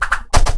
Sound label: shuriken ninja knifes; jidaigeki